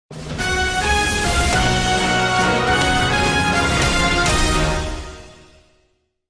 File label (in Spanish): Tema de victoria; Byleth; SSBU